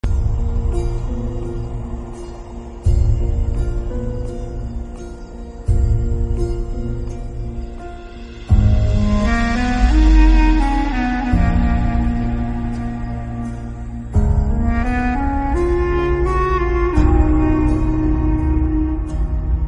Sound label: Bgm, Malayalam; Malik